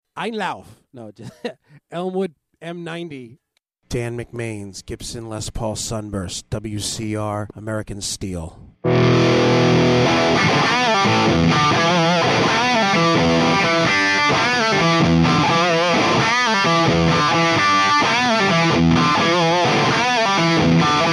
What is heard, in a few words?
elmwood m90 bridge